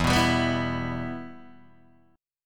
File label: E Minor E, Guitar, DADGAD; down